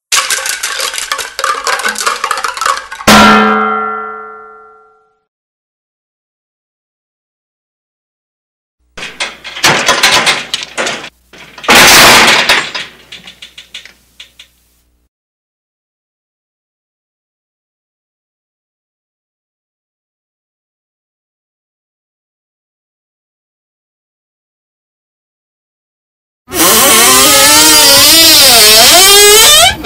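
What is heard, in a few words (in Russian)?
часовая подборка раздражающих звуков для соседей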